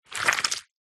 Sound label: gore guts bloody squeeze